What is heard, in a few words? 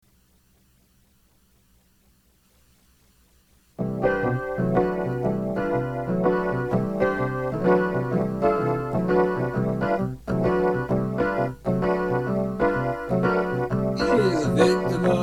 the victim chorus